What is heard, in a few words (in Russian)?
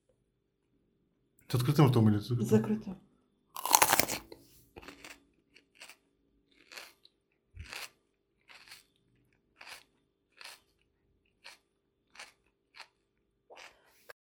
bolgarskij perec; Звуки откусывания